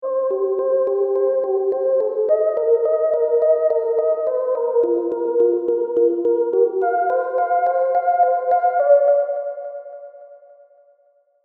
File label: serum seqs arp; chiqui audio